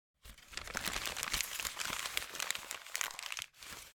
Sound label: Crumpling Paper